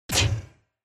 Spotlight

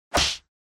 Hit

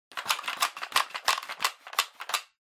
Pencil Case Shake

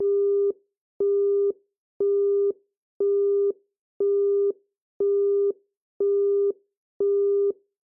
Telephone Signal Tone Busy

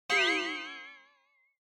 Negative High; Onoma

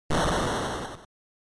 General Noise Single

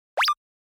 Motion Pop

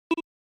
SNES Golf

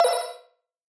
Warning Alarm

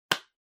Pencil Case Close

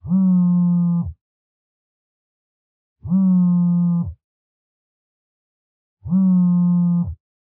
Cell Phone Vibration Blanket

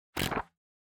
Book Flick Through

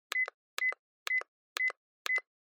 Cell Phone Hardware Button Beep

